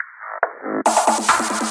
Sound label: Fill 140 BPM